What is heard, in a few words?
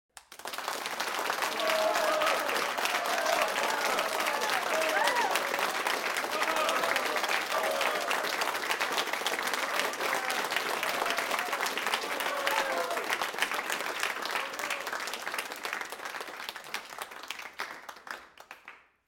Applause Bravo